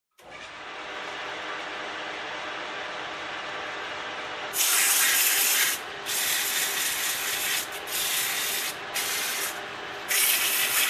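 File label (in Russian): звуки работы на токарном станке. шлифовка.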